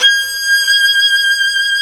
Synclavier Strings; ILIO

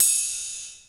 ANALOG COMBO; OMI - Universe of Sounds

